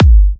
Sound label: G, kicks; markus hakala kicks